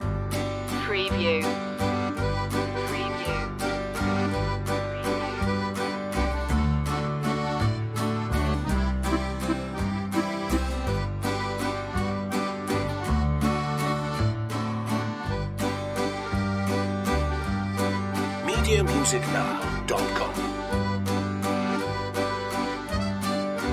cajun bon ami loop

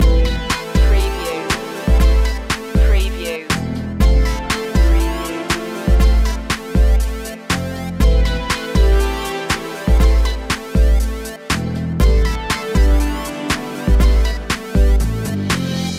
it girls loop

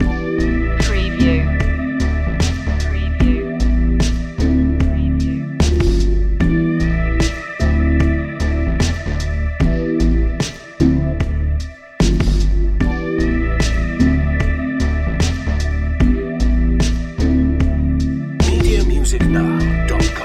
jazzy trap loop

